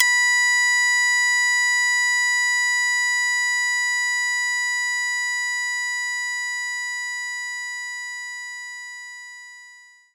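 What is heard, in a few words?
Shamisen C#4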